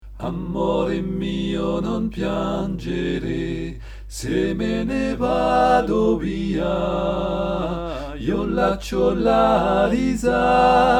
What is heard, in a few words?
tutti; Amore mio